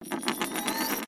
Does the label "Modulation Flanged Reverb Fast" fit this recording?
No